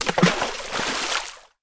water; pond5

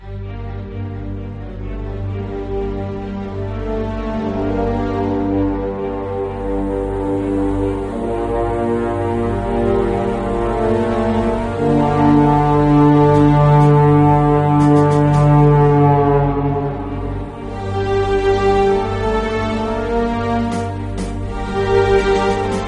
music tense loop

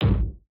thud light; battle; CrossCode

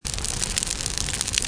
burnt; survival

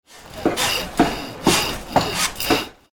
destroy; anno-1404

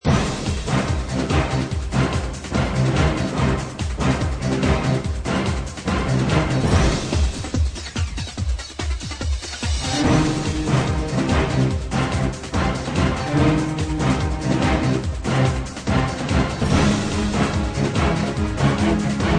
bg music loop; adultswim; FiveMinutesKillYourself

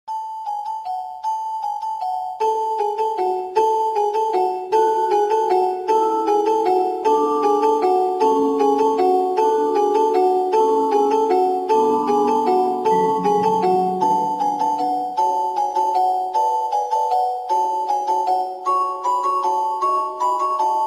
christmas, music, winter; Flashpoint Ultimate 13; pandandago

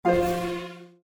Combo Boom; Kung Pow

